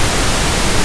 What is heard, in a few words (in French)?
bruit rose stereo